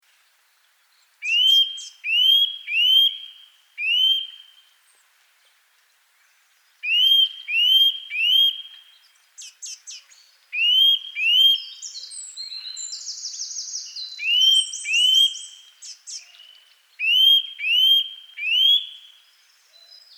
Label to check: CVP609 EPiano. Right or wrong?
Wrong